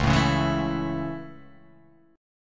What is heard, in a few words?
Db Minor Sharp 5th Db; strummed; Dbm#5; JGuitar